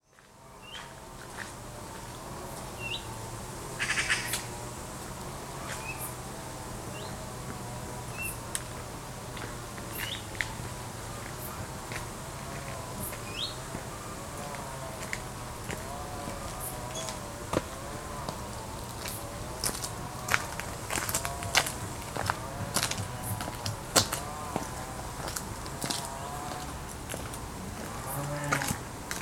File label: main street morning; sharapovo